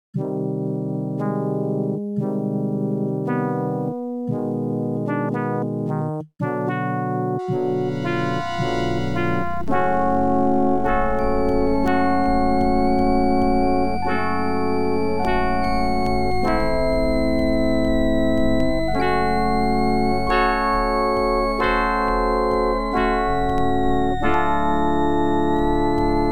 OPL2; X WING

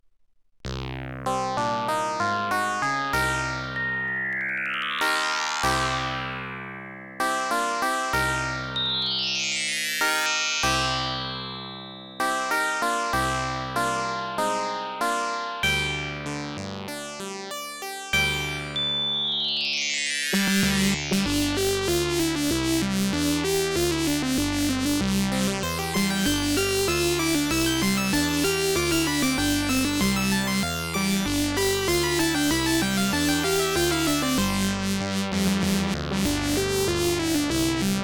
OPL2

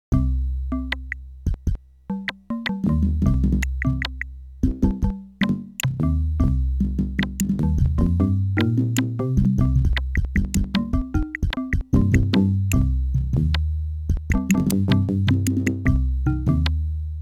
OPL2; Monkey Island

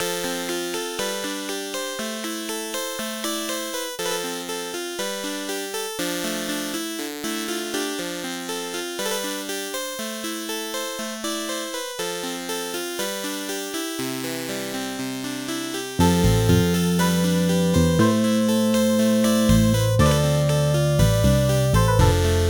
OPL3; Tetris

